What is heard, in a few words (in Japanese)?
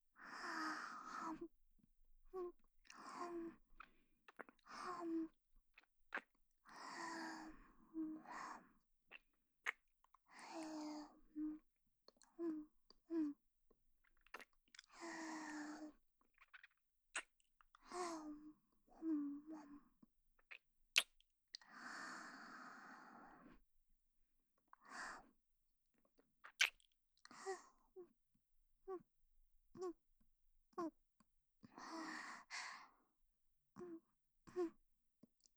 フェラ音